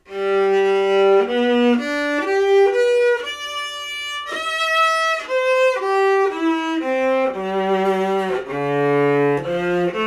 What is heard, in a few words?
Plywood arpeggio study; viola-acoustics